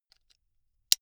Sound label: buckle plastic insert close soft